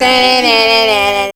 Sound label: VB MEL, Voice Spectral